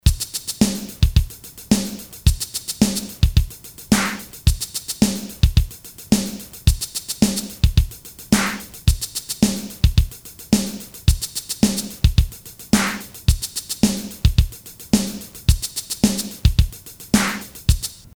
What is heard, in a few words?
Rock unsteady; Akai MPC1000; Flash memory demos